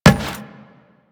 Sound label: Run Metal, Footsteps; Warframe; Teshin